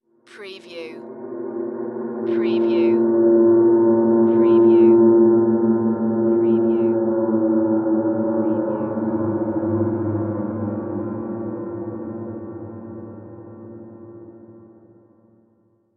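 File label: Production Element Swell; Sound Effects Bible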